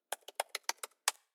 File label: Keyboard